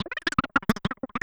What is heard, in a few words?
CHAT FLY, Anime Sound FX; COMIC